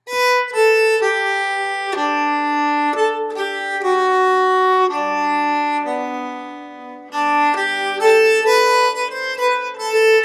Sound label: voix, nyckelharpa